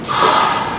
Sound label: air zero; R220 MUE5 - 6 Car (Alstom) 2019-Present